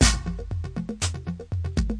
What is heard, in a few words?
electro blast comp, 120 bpm, drum loops and breaks